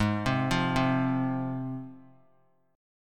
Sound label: G sharp 6th G sharp down, chord; arpeggiated; JGuitar